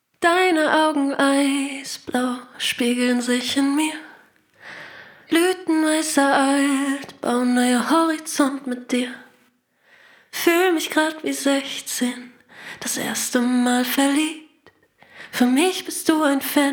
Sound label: Vocal Reverb Back and Side Walls Mid Filling